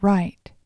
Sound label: Right, channels; test-card